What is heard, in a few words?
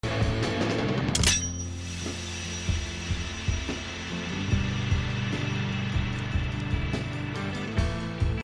Gun; NikitasGun